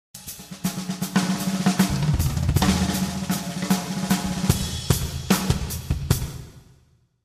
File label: fills